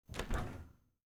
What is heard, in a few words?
fridge open, kitchen